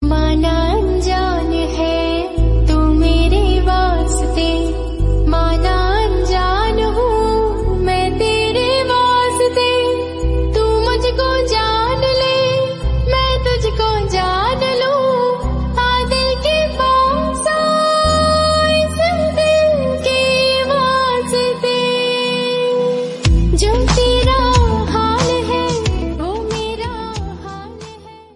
Hindi Song Ringtone

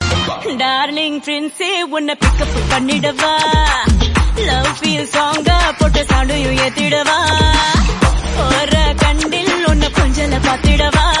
Romantic Song Ringtone

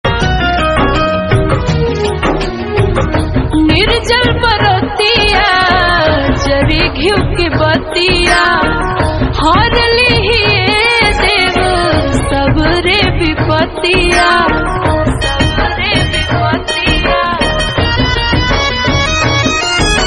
Jitiya Bhakti Ringtone

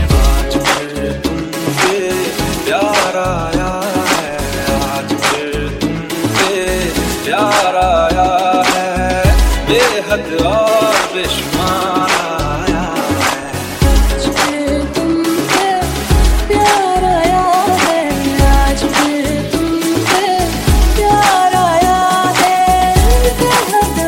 Valentine Mix Ringtone

